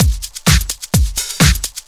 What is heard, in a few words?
Clubhouse Loop 128 BPM